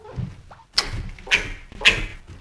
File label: keck, chinchilla